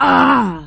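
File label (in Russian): ворчание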